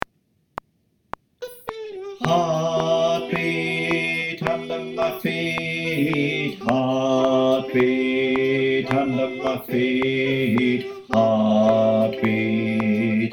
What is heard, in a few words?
bass click